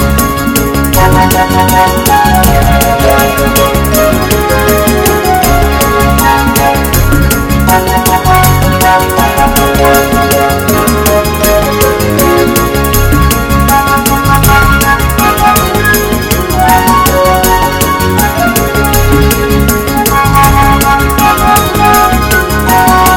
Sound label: Tough Walking